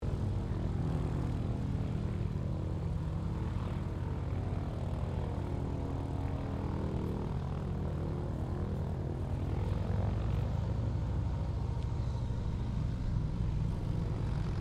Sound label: AIRCRAFT CESSNA 195 TAXI; Airplanes; Vehicles; Aircraft Collection; Twistedtracks